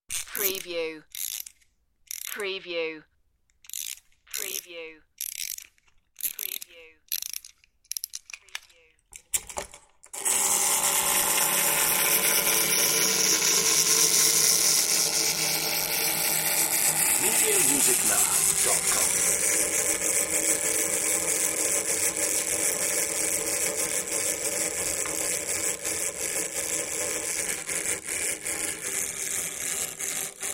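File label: Wind Up Toy Run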